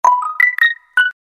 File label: Notification; Realme 11X